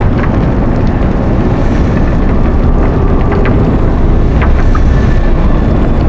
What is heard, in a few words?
aura drill, ambience